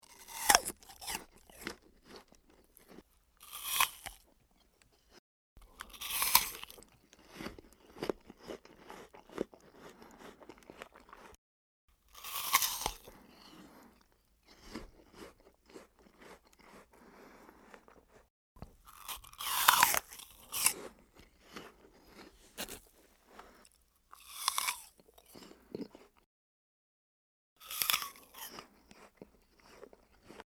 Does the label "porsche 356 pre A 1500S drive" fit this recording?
No